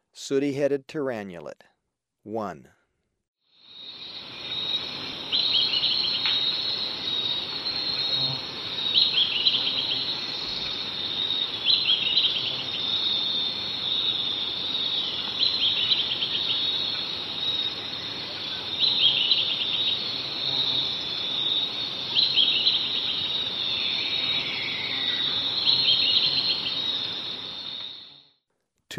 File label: Sooty-headed Tyrannulet, Ecuador; S. America